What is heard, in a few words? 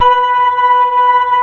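C3, major minor violin trill; East West; Global Sounds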